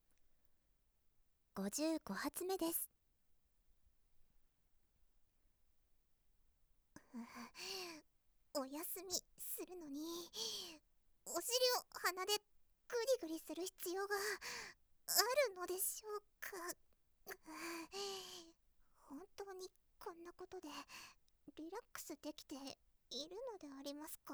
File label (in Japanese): 放屁音; 使用放屁音収録実演音声集